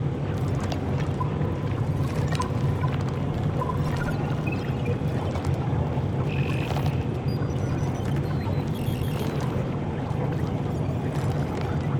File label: ambient warship; ai civ; Stellaris